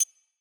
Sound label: Point Add, UI; K Drive